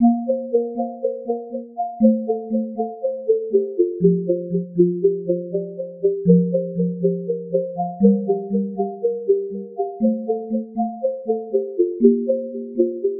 synth loop softly floating F maj

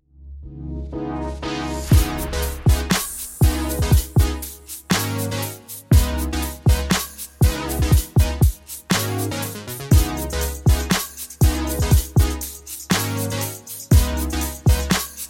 Percussion; Proper

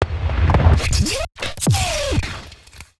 fx impact mid combo C

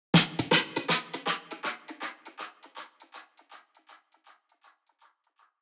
120 Perc Splatter Snare

